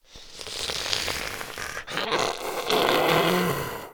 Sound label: idle, zombie; DayZ-Epoch; dayz sfx